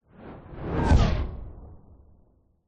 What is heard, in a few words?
science fiction thud hit whoosh